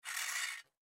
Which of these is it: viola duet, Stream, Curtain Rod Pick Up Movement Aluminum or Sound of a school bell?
Curtain Rod Pick Up Movement Aluminum